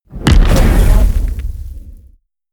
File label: fire whoosh sci-fi medium